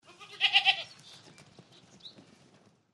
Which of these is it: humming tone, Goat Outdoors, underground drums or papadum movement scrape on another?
Goat Outdoors